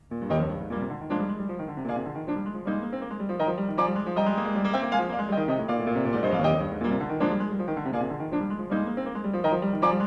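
15 Variations with Fugue in E-Flat Major, Op. 35 Eroica Var. IV, Piano; Beethoven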